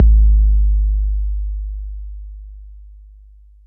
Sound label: BD 808 Deep